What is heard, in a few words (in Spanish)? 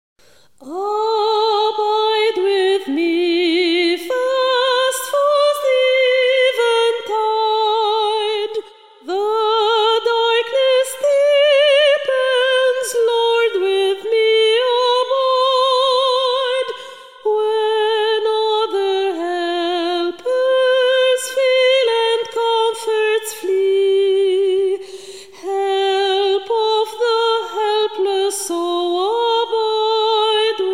Pista soprano, musica